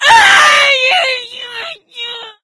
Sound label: ignite, infected, female; Left 4 Dead 2; Source Engine